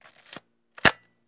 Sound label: 35mm Camera Wind; shutters & movement